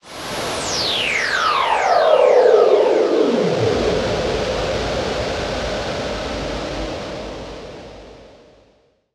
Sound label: Filter Sweep, Cinematic Metal Impacts; boom library